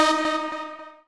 lead d#4

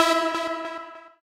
lead e4

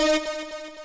lead d#4

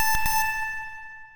fami a3

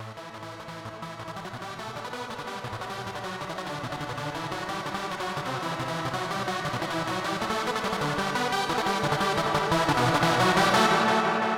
lead1 fadein

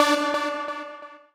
lead d4

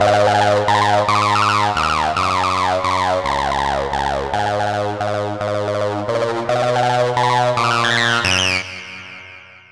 bass fill

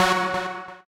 lead1 f#3

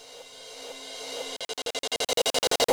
rev cym

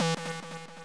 sub lead e2

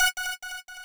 lead f#5

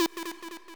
sub lead e3

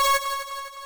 lead2 c#5

